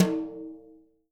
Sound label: BRUSHES, Living Drums